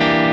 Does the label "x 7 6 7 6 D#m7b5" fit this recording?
Yes